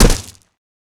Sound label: land gravel, footsteps; player